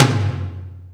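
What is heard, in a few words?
Lo Cannon, Alesis D4 drum machine tom; 300 Drum Machines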